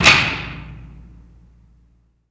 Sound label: Jail Door Latch; jail & prison doors